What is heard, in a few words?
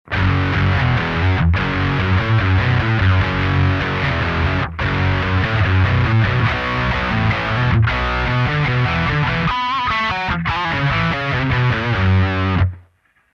solo all mid higain